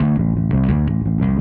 Static Bass; Sonic Foundry (Sony Creative Software); Indie Rock Anthems; Session 13 - Static

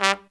T G Q, TRUMPET FX; Zero-G; Phantom Horns

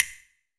CASTA AMB, CASTANETS; AKAI S-Series CD-ROM Sound Library VOL-5

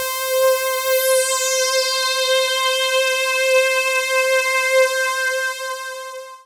PAD, DIGI-PAD

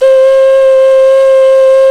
Clay Flute

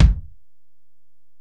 Ac BD, Alesis DM-PRO; 300 Drum Machines

